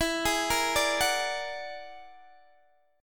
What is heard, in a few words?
E 9th Flat 5th E down Guitar Open D